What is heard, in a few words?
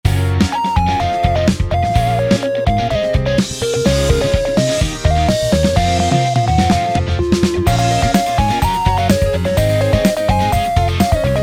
Drums